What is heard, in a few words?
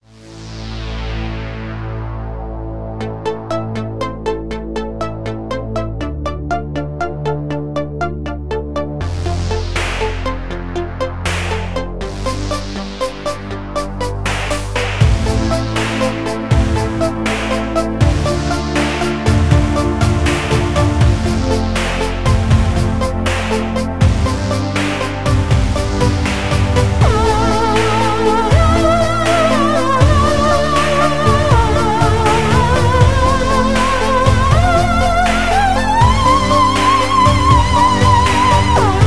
Synth Opera